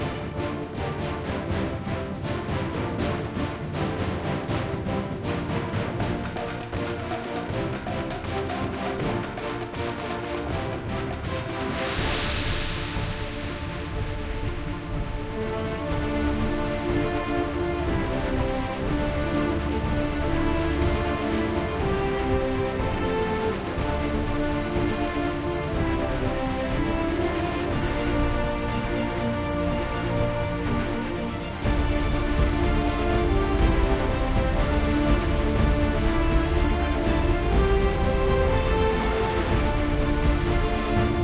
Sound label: Battle; Planet Explorers